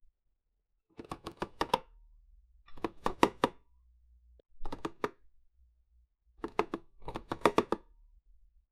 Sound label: quiet realistic, time capsule